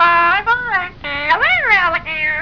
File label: bird talk bye